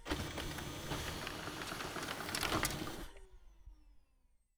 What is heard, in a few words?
Photo Print; in-game sound effects; Papers Please; android ios windows gamerip 2013